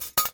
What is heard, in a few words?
post